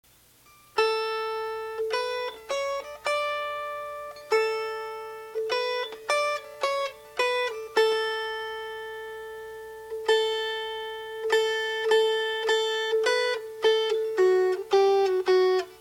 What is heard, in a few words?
Melody